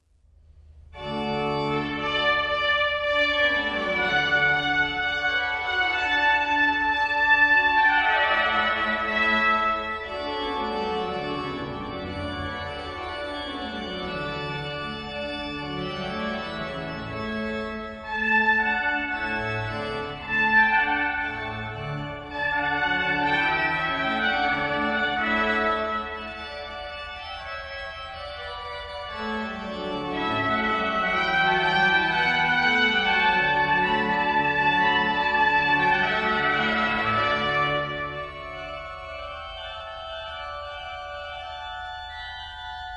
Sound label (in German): Orgel und Trompete zum Einzug; Hochzeiten